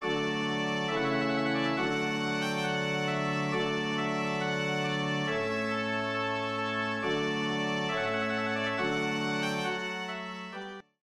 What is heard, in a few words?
Trumpet Voluntary